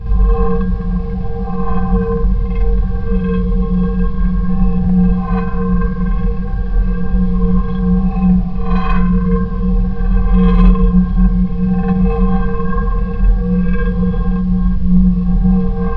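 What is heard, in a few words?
Knife Drone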